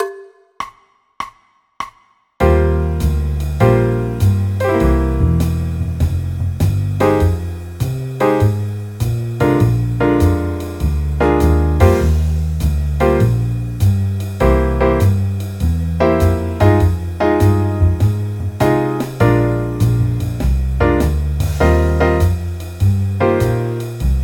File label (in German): eb dur akkordverbindungen